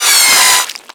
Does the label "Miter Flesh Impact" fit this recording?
Yes